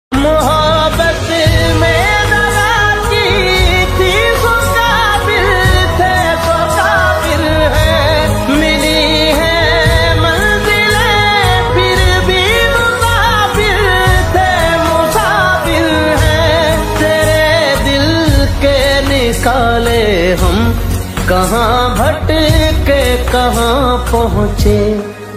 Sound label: sad song ringtone